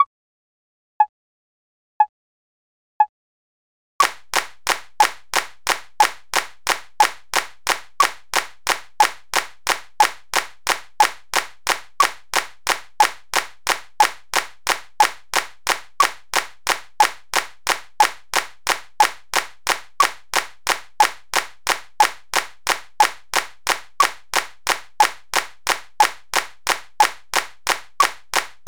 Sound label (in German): achtel triolen 60, rhythmik